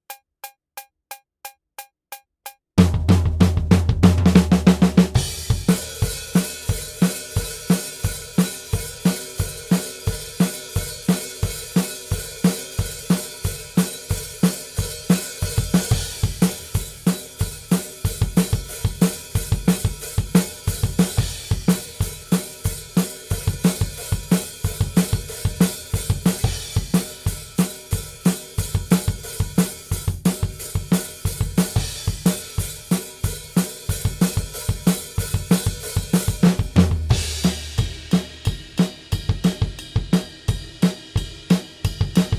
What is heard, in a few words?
Drums ONLY; Pre Pro